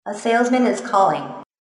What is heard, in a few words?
Melody, RingTune